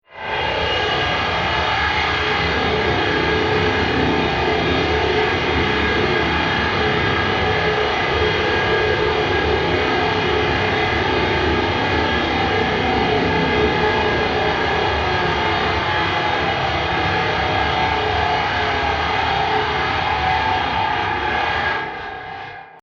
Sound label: Drilling Machine Large Motor Mechanical Industrial Element; Descends (Sound Effects:Sound Design)